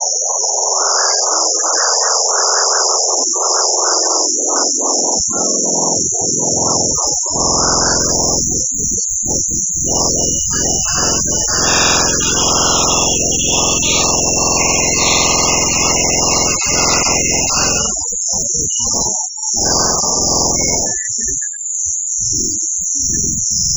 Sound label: negative; forest three